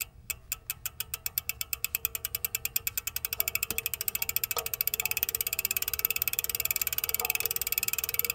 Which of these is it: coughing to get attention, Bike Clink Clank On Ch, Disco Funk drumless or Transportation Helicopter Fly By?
Bike Clink Clank On Ch